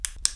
slender flashlight click

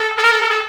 trumpet danger; music; szf; zombat

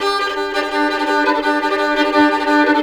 violin slayer, fiddle; music; szf; zombat

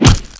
bullet hit flesh; trespasser

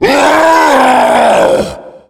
tank yell; trespasser

